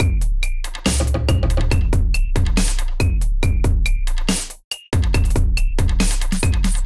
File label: Kit; Kindified Kit; Ableton; Bomblastic